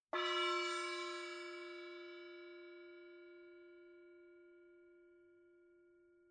Ma Gong Medium; Weiss 7" MA Gong; gongs

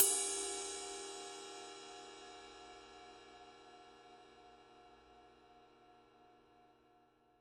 ride body, Paiste 22" 2002 ride cymbal

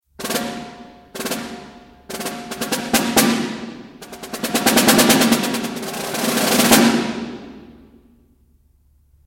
concert snare drum; black swamp pro10 concert snare drum; black swamp snare drums

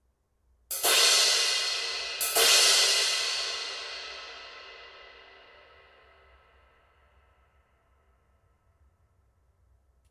K EFX 18, cymbal; K ZILDJIAN

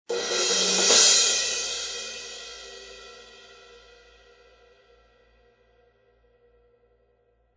fast crash; AAX X-Plosion; cymbal; Sabian

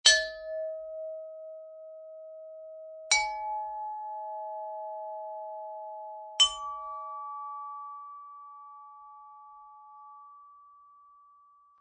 Alu Bells 11 9 7; Sabian

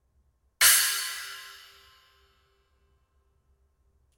ZXT Trashformer 8, cymbal; zildjian

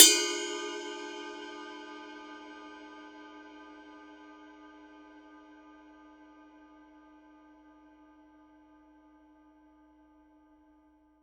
24 mega power ride bell; paiste 24 rude mega power ride cymbal

